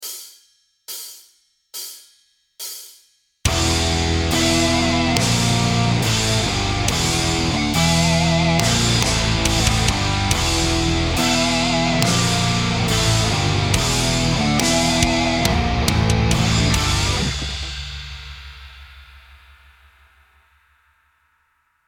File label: Blues Riff